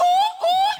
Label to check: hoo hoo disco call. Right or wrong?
Right